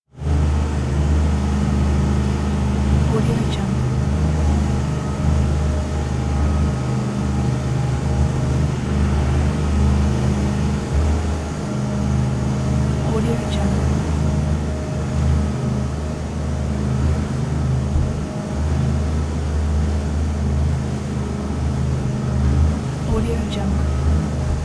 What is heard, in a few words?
1977 chris craft twin engine fishing boat; prosoundfx